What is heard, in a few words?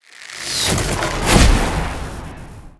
e skill big arrow